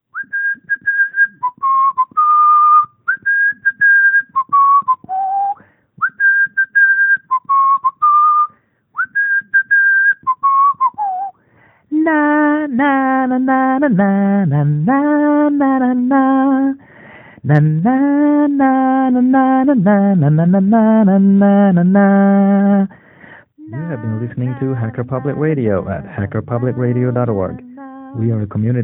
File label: outro mixed mono; clacke